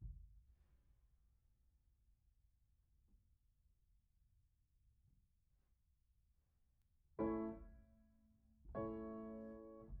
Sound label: Piano Mono